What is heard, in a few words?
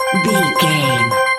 point sticker bonus score reward loop